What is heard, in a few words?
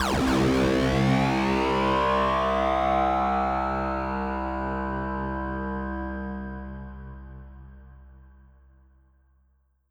cosmic space high